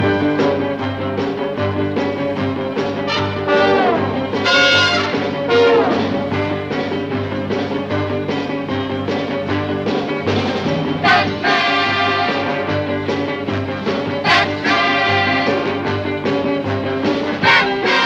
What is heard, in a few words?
music - theme loop